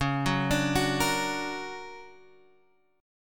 D Augmented Major 7th, chord

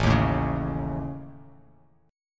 A Major Flat 5th; strummed

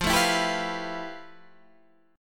F Major Sharp 11th, Guitar, Standard; strummed; JGuitar

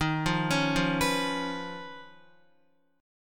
D sharp 7th Suspended 2nd Sharp 5th Guitar; D#7sus2#5 arpeggiated

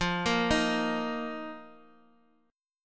F 7th Suspended 4th F down

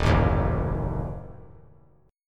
Eb Diminished; Ebdim strummed; 3,4,6,4; JGuitar

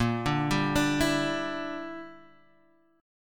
E Minor 7th Flat 5th A sharp

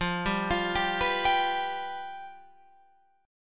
F Suspended 2nd Flat 5th, Guitar, Open F; arpeggiated; 17,14,17,14,14,14; JGuitar

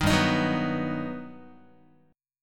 G Augmented Major 7th, Guitar

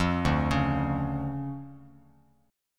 D Minor Major 7th D down Bass Standard; DmM7 arpeggiated; JGuitar

